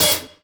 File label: sharp open hat g sharp key; open hat samples